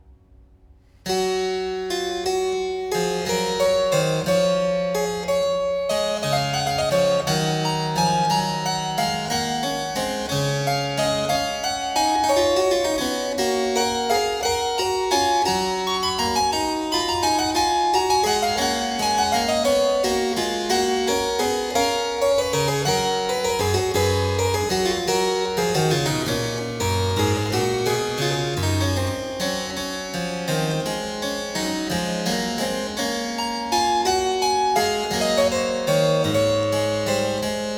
Goldberg Variations - Variatio 24. Canone all' Ottava a 1 Clav.; J.S. Bach